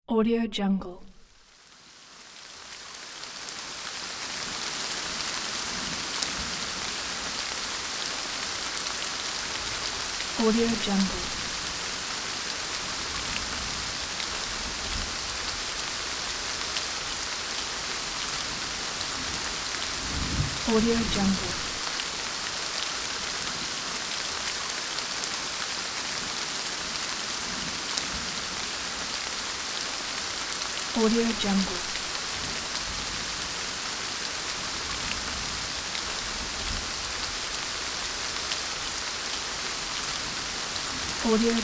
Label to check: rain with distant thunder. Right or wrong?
Right